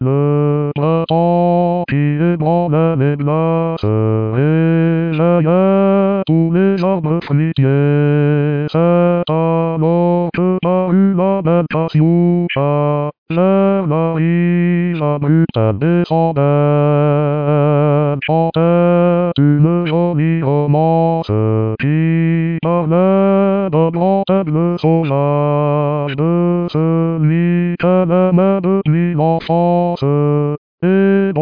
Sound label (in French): Baryton